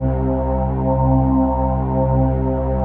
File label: PHASE PAD